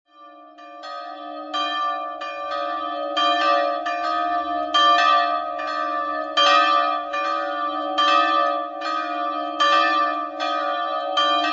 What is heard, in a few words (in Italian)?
carvacco, campane